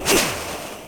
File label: Shoot FireBall; Captain Bumper 0.58